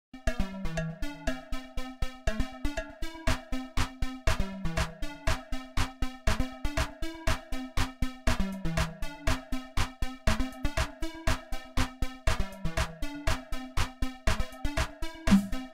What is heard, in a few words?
hardstyle